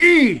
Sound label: Archer Hit; Sid Meier's Civilization 4 Complete; Warlords; Units